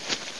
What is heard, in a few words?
Walk (Grass)